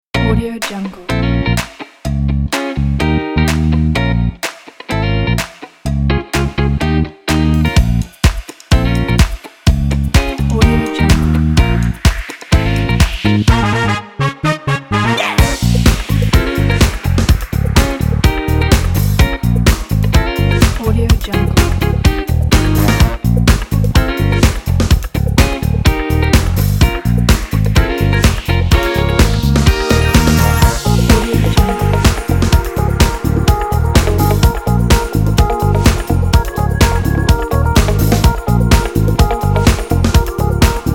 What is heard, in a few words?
funk groove